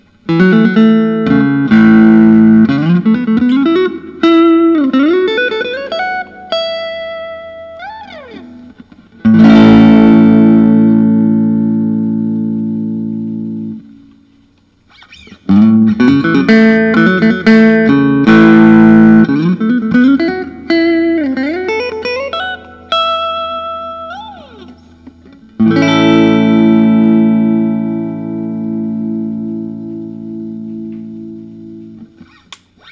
fender 90s tele thinline natural, Guitars Electric Solid Body